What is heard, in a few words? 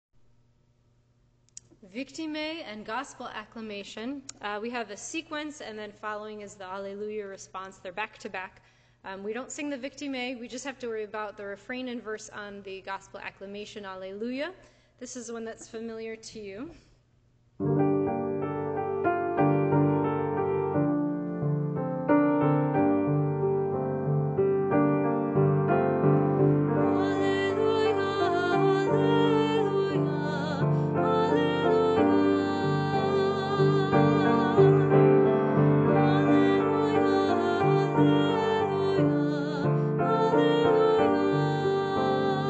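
Alleluia soprano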